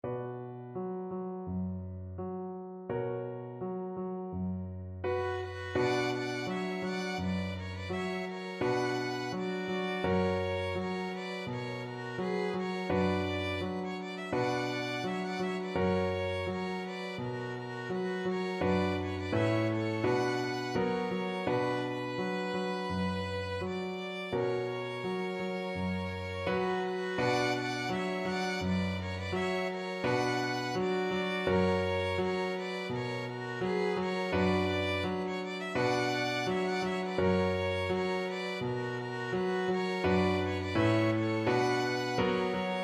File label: patapan, violin duet